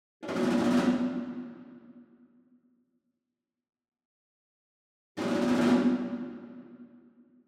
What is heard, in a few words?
ENSEMBLE DRUM Shime Daiko And Chinese Tom Roll Far; boom library; Cinematic Strikes Construction Kit